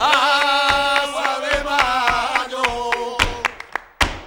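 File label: CLAP + VOCAL, MCLAPS+VOX; Best Service; World Colours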